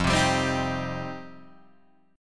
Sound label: F 7th Suspended 2nd F Guitar Open D